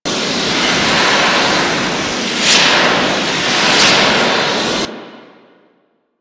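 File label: Flak Cannon Projectile Loop; Grineer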